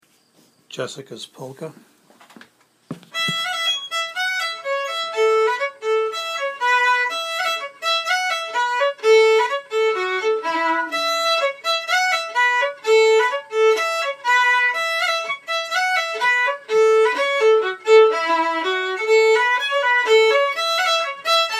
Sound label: Polka